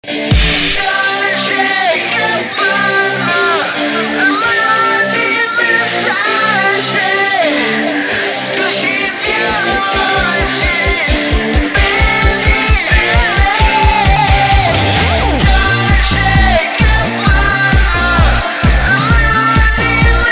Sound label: disco; waldorf